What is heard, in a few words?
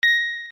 block shield; ff3us